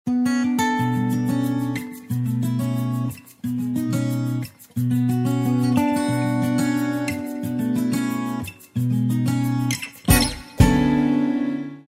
guitar sms

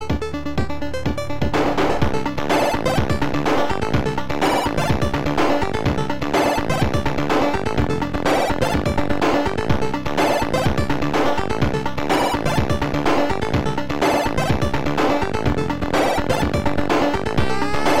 Game Cool Notes

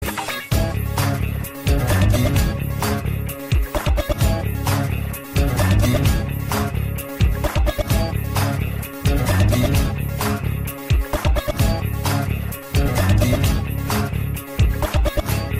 Guitar Drumming

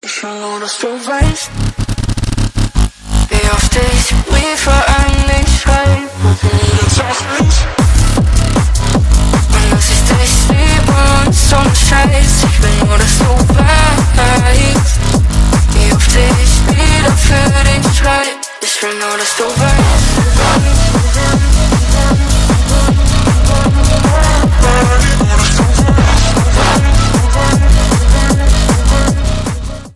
Techno Remix; klingelton

